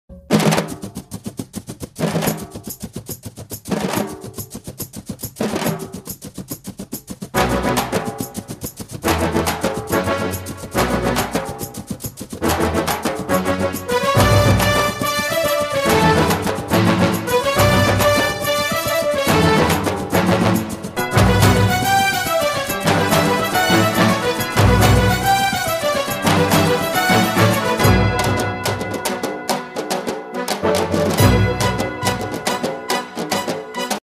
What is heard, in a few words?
ringtone tamil bgm ringtone; manmadhan